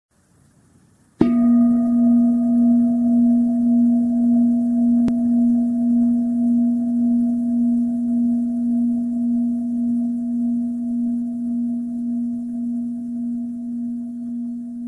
bird song; Практика осознанности при работе за компьютером. Пение птиц. 26 мин.

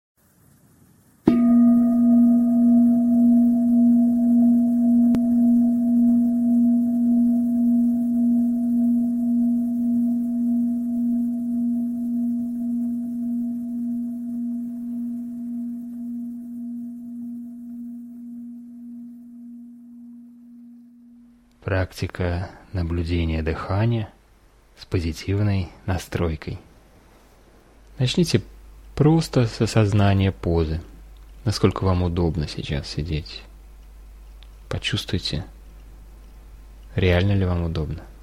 breathing ocean waves; Практика наблюдения дыхания, с позитивной настройкой. Океанский прибой. 32 мин.

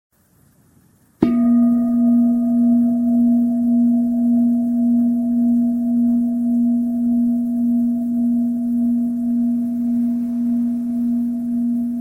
vipassana ocean waves; Випассана 1 вариант – с именованием. Океанские волны. 28 мин.

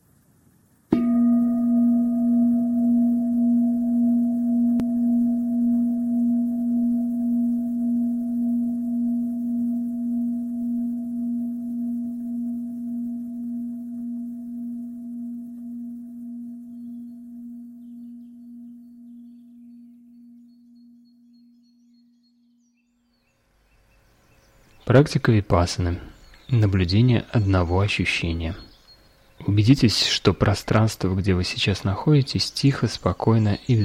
vipassana bird song; Випассана 2 вариант – наблюдение за одним ощущением. Пение птиц. 24 мин.

